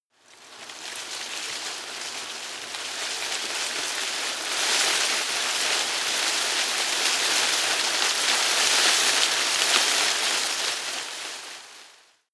tree small leaves rustle heavy

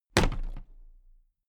toolbox place upright heavy

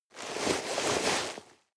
nylon leather walk

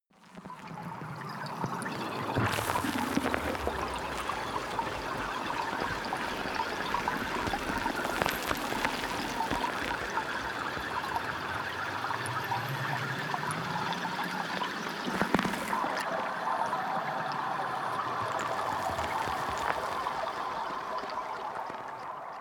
water fx crackling

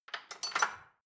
dishwasher cutlery grab

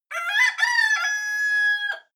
cock call distant

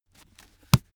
heavy book shut

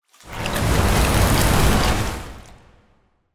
water morph hit

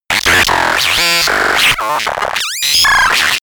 glitch communication

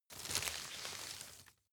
grab crunch medium

